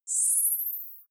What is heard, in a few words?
zoom out, signale